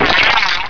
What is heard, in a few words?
drain; Hexen II; weapons